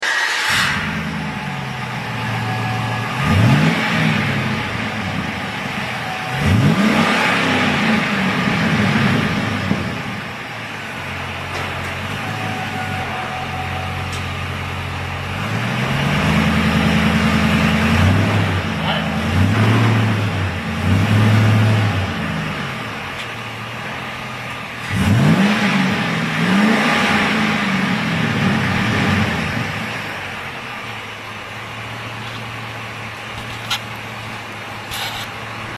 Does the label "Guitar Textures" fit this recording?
No